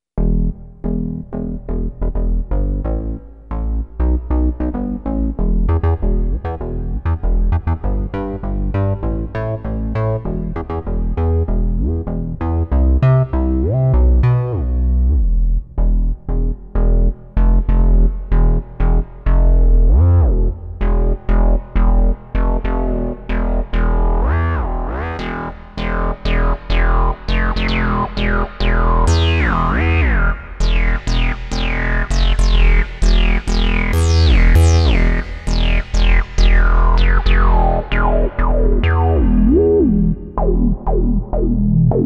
Bass with Resonance, Oberheim OB-8

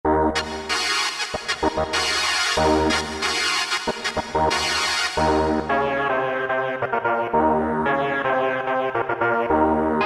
Weird Organ, Roland PN-D50-01

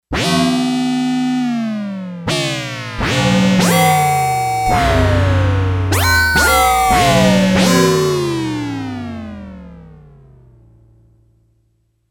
Space Gong

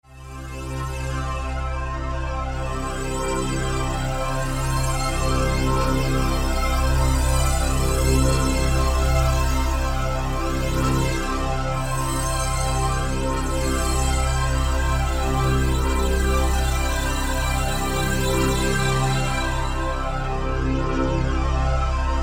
3D BAG SWEEP, Roland SC-880; Factory Patches

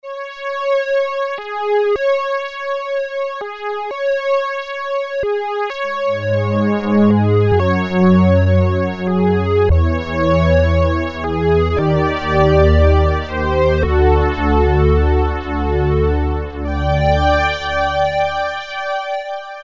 Phase Ensemble, Roland Juno-106; Factory Preset

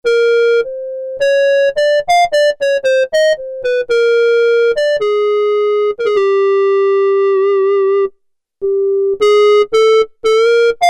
CLARINET, Korg DW-8000; Factory Patches

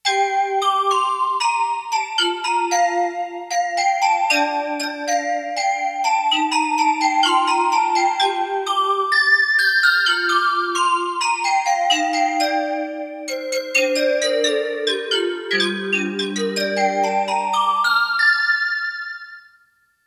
BELL, Roland JX-1; Factory presets

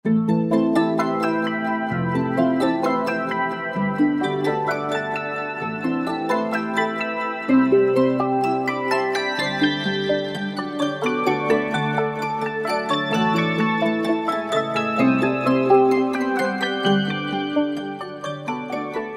Afroharp Arq; World; Roland SR-JV80-05

